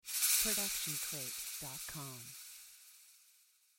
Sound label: rattle warning